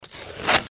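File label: adhesive tape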